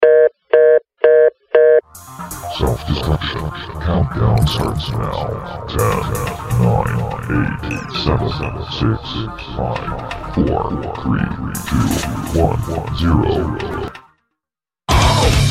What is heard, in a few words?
self destruction